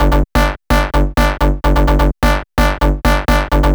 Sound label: Melody 128 BPM Root G; Vengeance Samples; Melody Kits